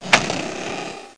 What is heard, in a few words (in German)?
Backofen; tivola; max1demo